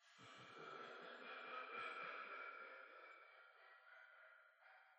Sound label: laugh; The Joy of Creation Halloween; Verblaugh 2